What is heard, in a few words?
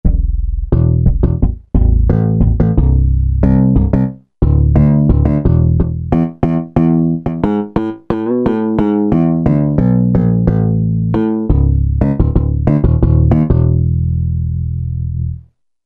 Tap Bass, Korg MSC-14S; Korg MSC-14S Sound Effects